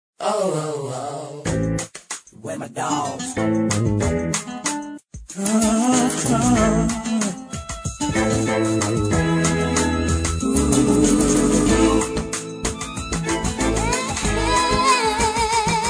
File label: Latin Rhythm, phonetones Ringtones Melodies; LG550 Fusic